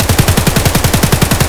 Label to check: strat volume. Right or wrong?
Wrong